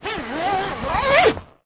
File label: foley bag holdal polyester zipper; zapsplat